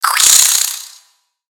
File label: Spider Angry